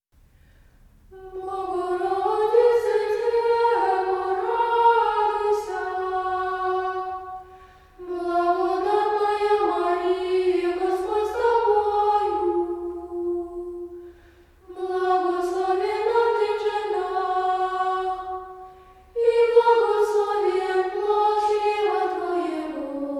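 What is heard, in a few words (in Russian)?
Children's ensemble of znamenny chant, troparion at vespers; Detskij ansambl znamennogo rospeva Kolokol; Bogorodice Devo radujsya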